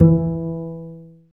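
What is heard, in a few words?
contrabass jazz pizzicato; Roland - LA Composer vol. 1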